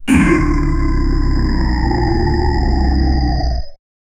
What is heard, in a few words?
demon dying sound in a